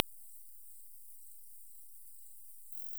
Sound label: Vespadelus darlingtoni